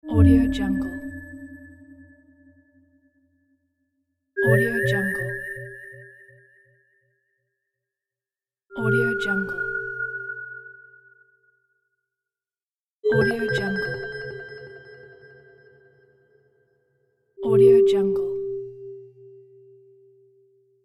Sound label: ufo signal